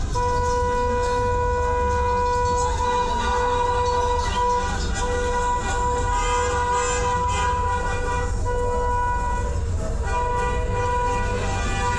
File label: foley